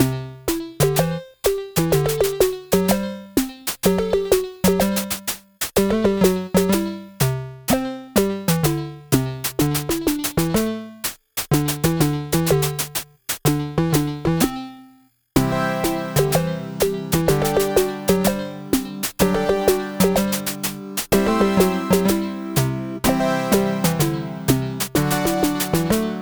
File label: menu; A Cat Game; Monarkie Digital